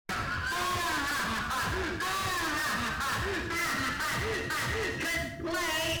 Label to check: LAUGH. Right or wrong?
Right